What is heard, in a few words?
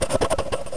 Scribble